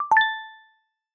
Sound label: notification high intensity; Google; Material Design sounds